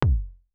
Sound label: Bump